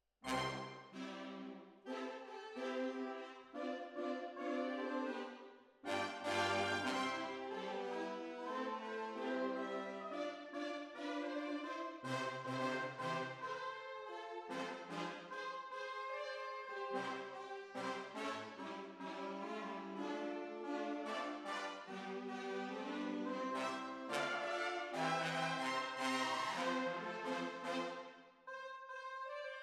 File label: Hall